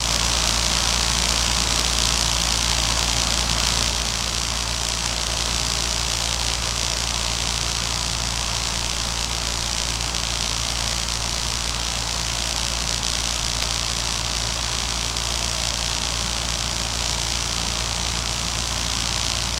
Power Plant Elect Li, buzz & hums

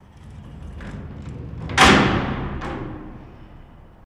Jail Door Roll Close; jail & prison doors

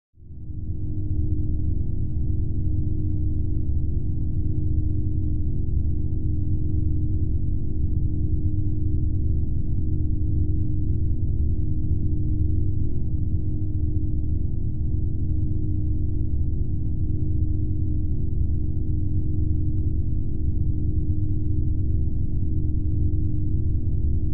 Space Ship Hall Hum

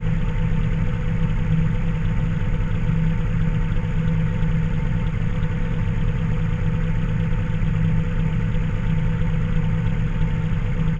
ENGINE HUM, drones & tones

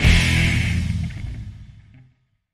Music Modern Rck Dru, guitar

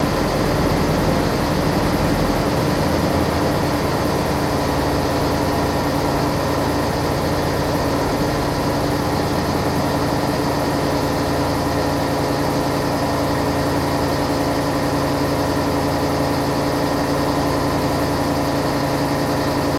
Boat Exhaust Lower, idle

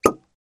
Bottle Open Wine Cork; eating & drinking

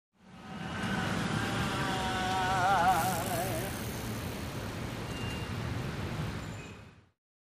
Single Bicycle By Man, bicycles

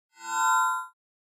Mouse Over HF Elect, mouse over

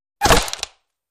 Bullet Impacts Wood I

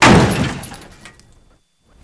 Jeep Crash Jeep Glas, car crashes

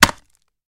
Staff Wood Hit Woody, spears & staffs

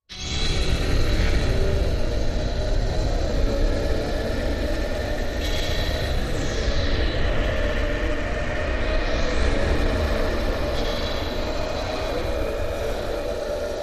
Much Confusion Confused Wind Traffic Motion; Increasing & Reverses

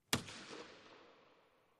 Hockey Puck Hits

